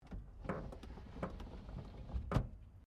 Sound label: CAR MAZDA RX7 SERIES3 EXTERIOR WINDOW CLOSE; Car Mazda Rx7 1983 Series3; Cars; Vehicles